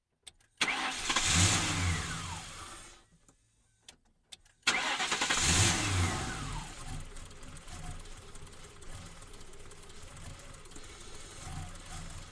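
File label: ford crown victoria exterior idle stall various engine left; dpa4061; Sonniss GDC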